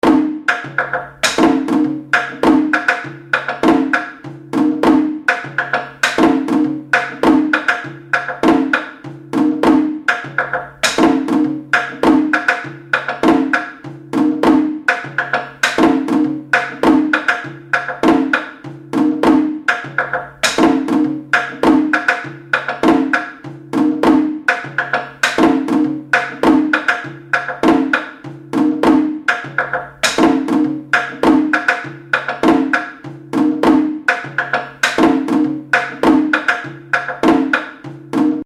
War Drums Loop; Musical Sound Effects

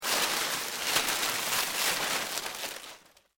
LEAVES DEAD MOVE, Rustling; Material components for constructing more complex sounds; Rustling (Sound Effects:Objects)

